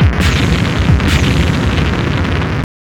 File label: WAR FX